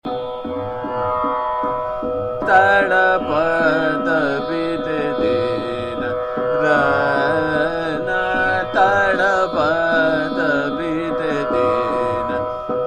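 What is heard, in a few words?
Chota Khyal, Trital; Nut Bhairav; Tadpat Beete Din Rain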